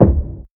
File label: reels stop; DraculasCastleWD; wazdan